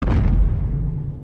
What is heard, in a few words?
godzilla step sound